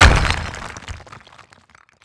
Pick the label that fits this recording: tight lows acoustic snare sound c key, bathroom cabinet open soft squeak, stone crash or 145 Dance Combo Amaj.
stone crash